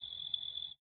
night insects; factorio

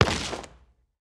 fly impact; enemies; Factorio; strafer

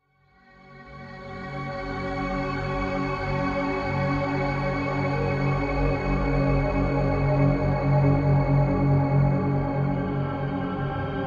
interlude, ambient; Factorio - Space Age

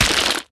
mined, mining; cuttlepop; Factorio

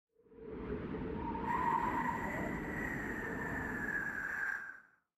cold wind gust; Factorio

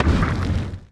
asteroid collision interstellar small; Factorio

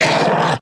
stomper warcry, enemies; Factorio

